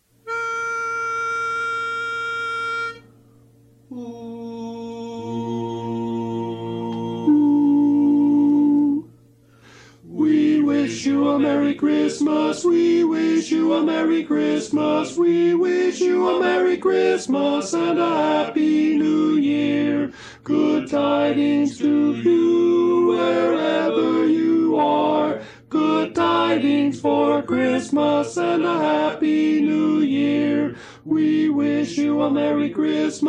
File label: Tenor